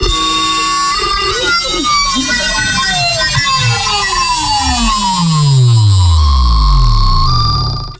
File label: Riser Rev Faling